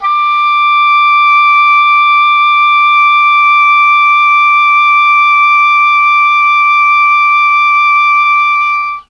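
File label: D4 oboe, chamberlin; CHMBLN OBOE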